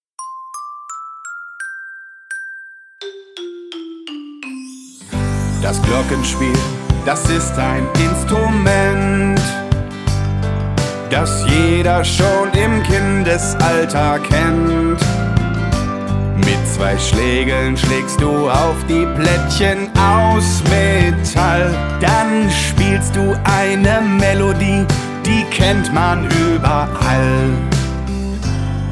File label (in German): Glockenspiel Xylophon; Alle Meine Entchen